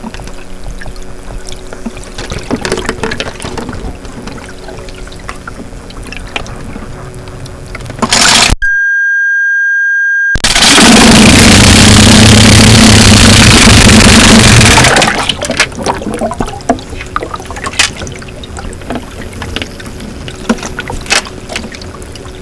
motor start, hydrophones

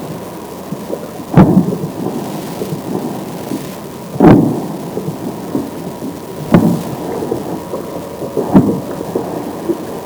humpback Tale Slaps

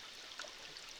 Background Noise Clips

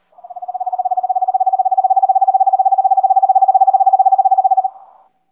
E screech Owl

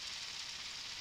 Background Noise

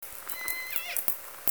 call

